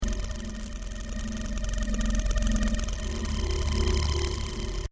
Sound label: fire; 5elements